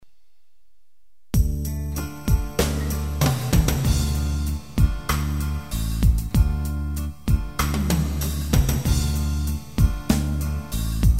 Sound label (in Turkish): ritim, KETRON sx3000